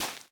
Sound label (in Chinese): 大型垂滴叶踩踏声